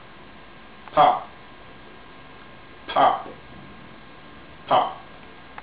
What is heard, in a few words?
kpop